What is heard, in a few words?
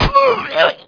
die; outlaws